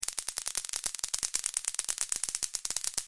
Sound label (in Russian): pechatanie kostyashkami